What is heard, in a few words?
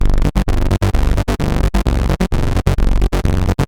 gfs pulse, edm bass